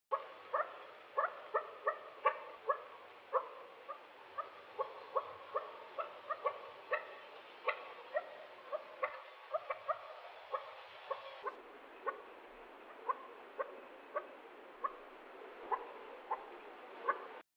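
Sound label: perepelka golos, quail voice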